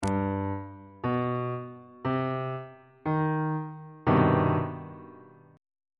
d double flat minor major 7th 2nd inversion on bass clef